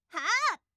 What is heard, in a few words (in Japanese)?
攻撃